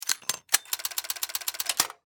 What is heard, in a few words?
Steampunk Gear Wind, Scifi, Steampunk, Gear, Wind, Device, Clicks, Crank; Science, Fiction; Steampunk Sound Effects